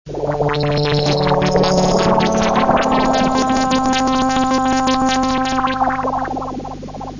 mess, poly

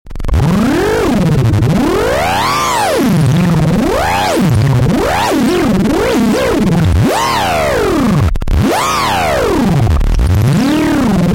patches, modular

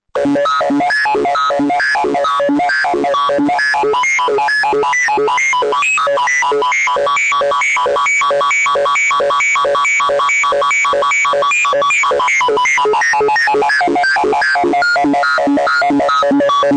steps, modular

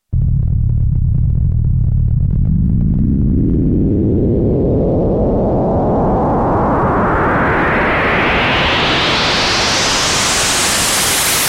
fm noise

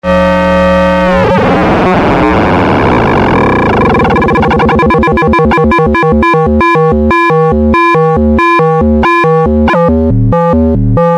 fm lfo, modular

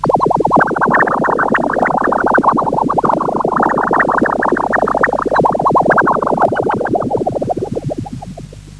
bloops, poly

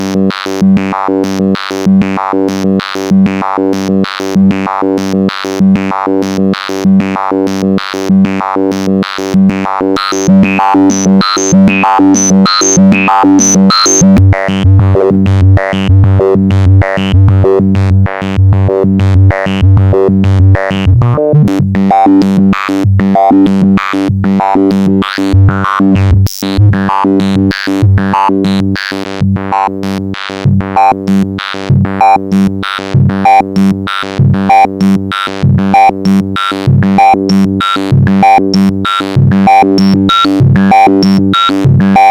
vcf

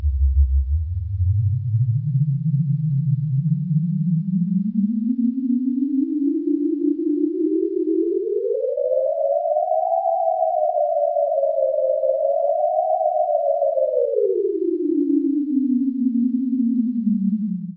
self osc, poly

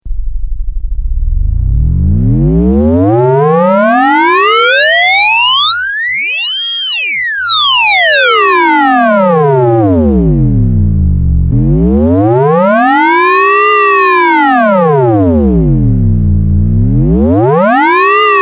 fm sweep, modular

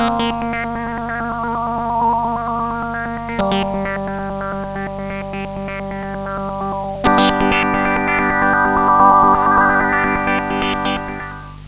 dco vcf

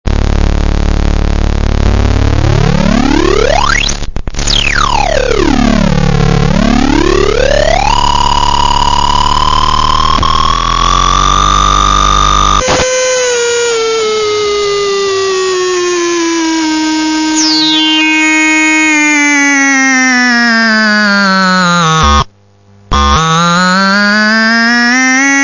pulser

